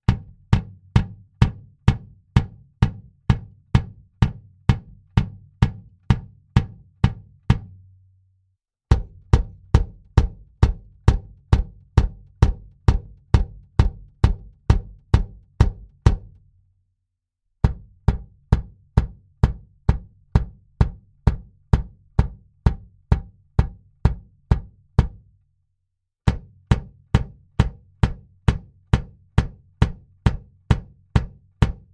BD Mic Test Pos C all

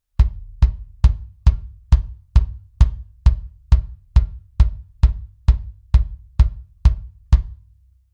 BD Mic Test Tech II Mix wet

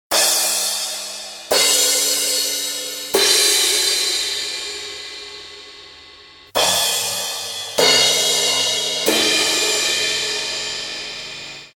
Vergleich Paiste MP3s echte Becken

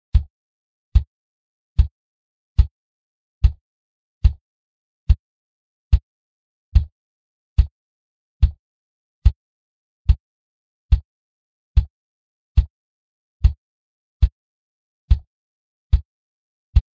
Bassdrum EQ Gate

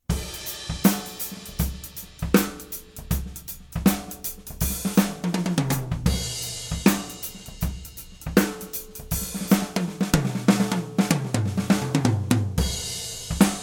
OH solo ganzes Set; e664 - nur Overheads - ganzes Set - mastered; Glyn-Johns

